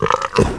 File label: zombie pain; cstrike; cz sound; zp general